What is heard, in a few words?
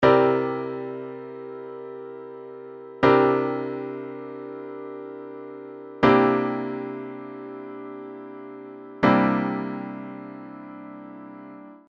C79 inversions; jazz chords